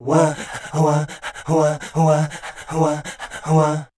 scat, voice

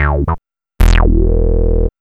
B LOOP; E-Lab; X Static Goldmine 1

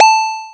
techno; E-Lab; X Static Goldmine 1

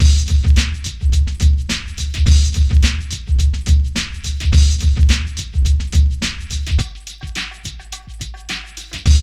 PHAT LOOP; E-Lab; X Static Goldmine 2; akai

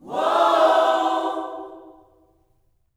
WHOA OHS, Gospel, Vocal; Spectrasonics; Vocal Planet Vol.1 Gospel

